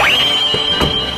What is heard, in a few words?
cab spawn